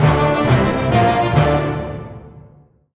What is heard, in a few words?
music random award; Hercules